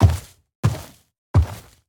脚步声; 嗅探兽

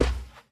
熊猫脚步声

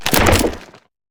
嘎枝攻击声

